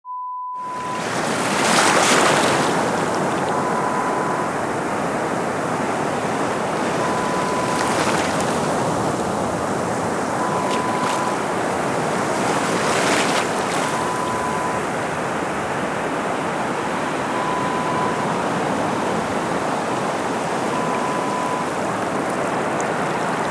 Sea sound

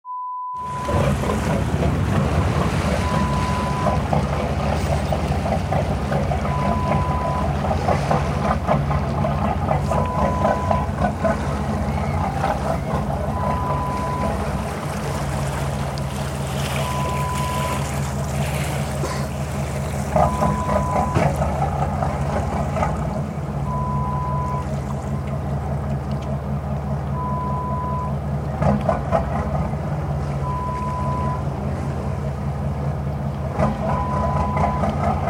Yacht sound

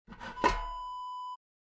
glass jar lid open

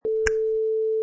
Finger Snap

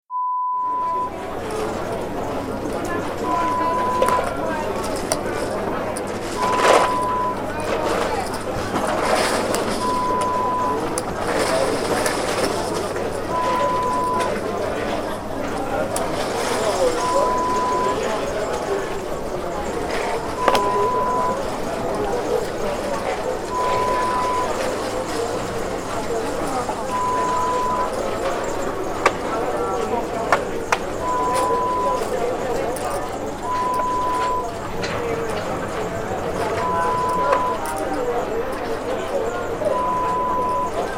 Casino Ambience sound

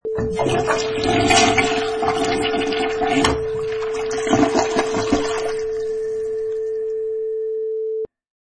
Toilet Flush

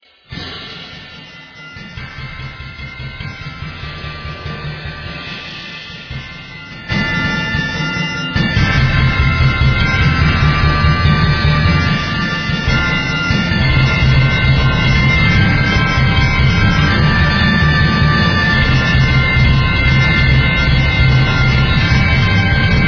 Manic Percussion

